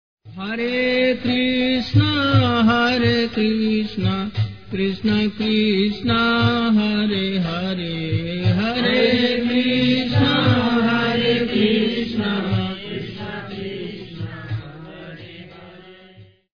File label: maha mantra